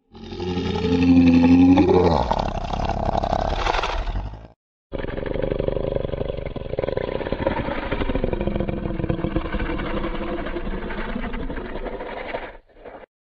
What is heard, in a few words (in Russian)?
cartoon dog ryk